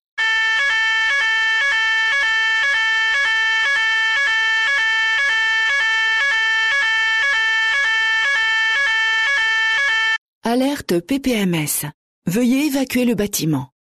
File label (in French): Evacuation avec signal